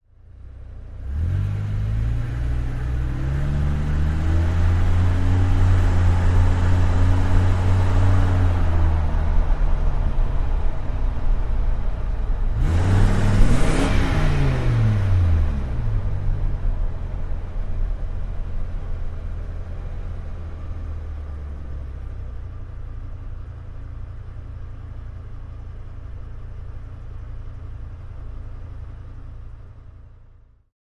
Dodge Ram: On Board Fast Driving, With Accel Away. Mic Tail Pipe And Engine.